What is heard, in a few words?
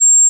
B flat 8, pure tones